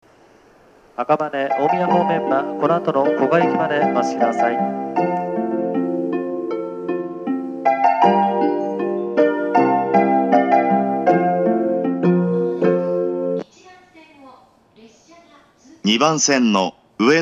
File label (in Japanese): 発車メロディー; 新橋